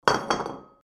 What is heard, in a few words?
noodle bowl